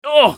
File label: usec wound; eft